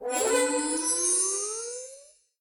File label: multi Attention; robin hood